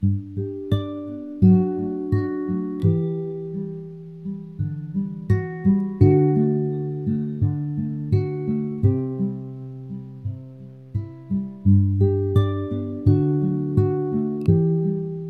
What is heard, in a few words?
bgm